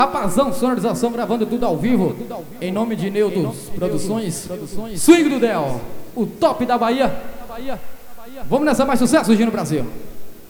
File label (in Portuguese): abertura swing ao vivo